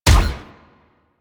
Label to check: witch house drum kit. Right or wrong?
Wrong